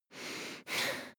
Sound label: breath female heavy calm down